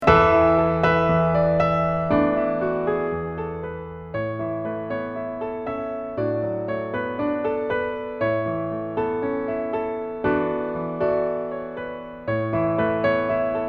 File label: accomp